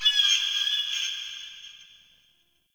Bird, Ambience, Garden; Eternium Garden

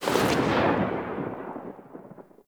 Spore Pistol Tail; Weapons

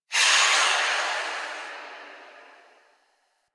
Fadeout Stinger; Enemies; Warframe; Entrati Doppelganger

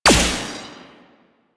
Laser Skin Printer Laser Start; Warframe; Ambience; Entrati

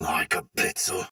block soldier sword agent male; Dialog; Barks; Battle; Melee; Sinecure

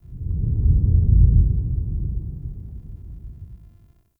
Under City Alley Low Rumble

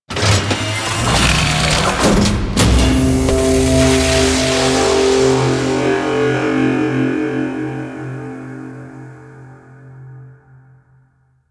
Ship Temple Pyramid Reveal; Warframe; Corpus Ship Remaster

